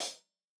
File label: HI HAT HIGH MED CLOSED HI HAT HIGH; Sonimus; a series drum pack; A-CONSOLE; A-EQ